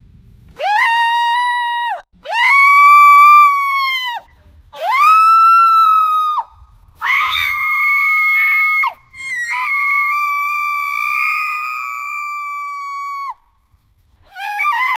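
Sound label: chimpanzee sound screaming; mammals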